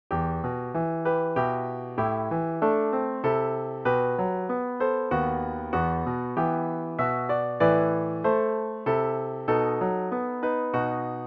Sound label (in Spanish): piano; Cantata para SATB, Solistas y Narración